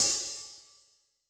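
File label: Heavy Open, HiHat, Yamaha DTXpress-II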